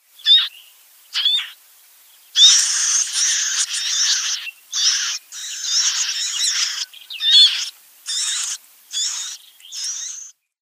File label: Willow Warbler nestling